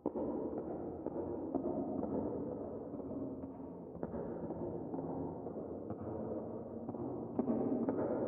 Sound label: footsteps; Code7